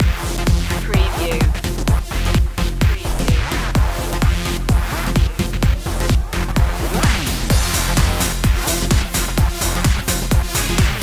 turn up the music loop

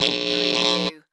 Electric Zap Short

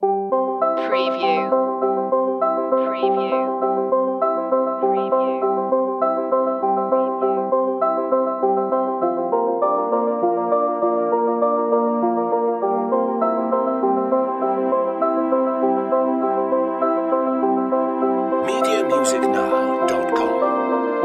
transition

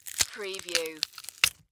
Green Pepper Crunch

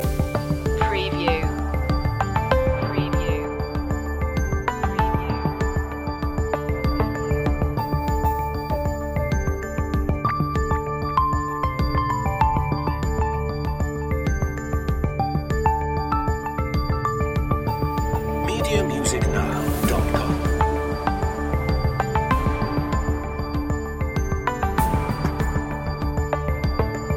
breaking headlines loop

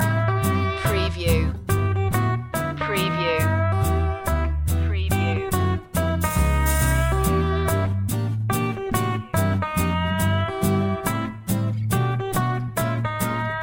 swing 81 loop

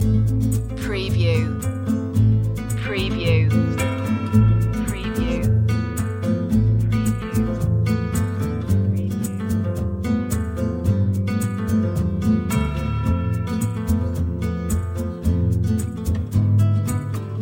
mexican church loop

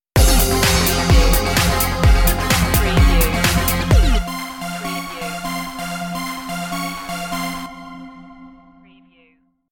friday night stinger

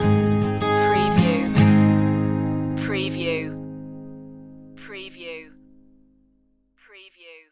home is where the heart is stinger

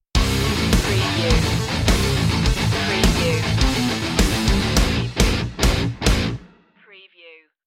melee master stinger

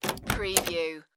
room door open

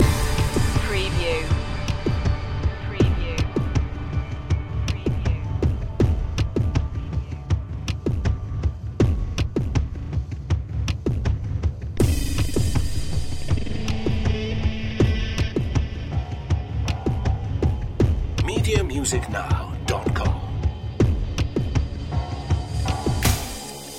cyborg dance short loop

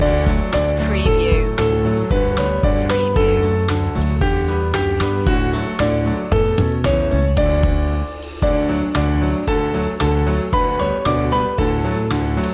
baa baa black sheep loop

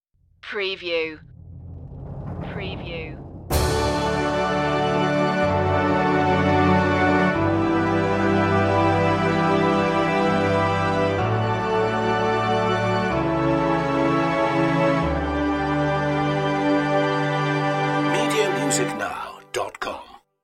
victory

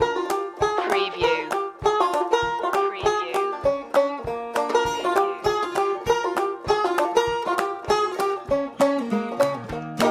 barnwood banjo

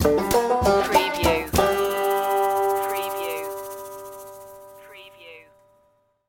catfishin stinger

